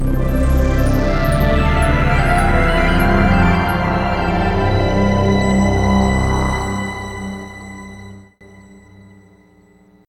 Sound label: TIME OVER (Game Over); Speed King Neo Kobe 2045